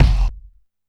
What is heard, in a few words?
Kick, Alesis DM5